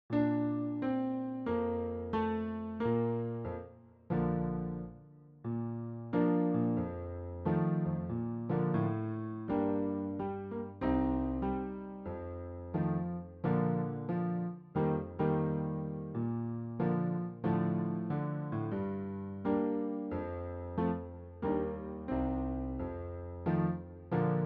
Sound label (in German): Lieder Trompete Merrily